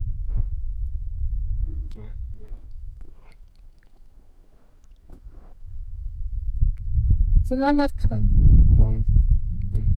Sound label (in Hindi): phadi pr chadta hua strug, effort